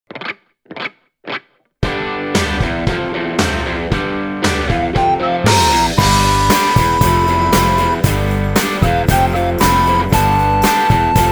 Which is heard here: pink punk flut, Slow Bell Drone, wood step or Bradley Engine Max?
pink punk flut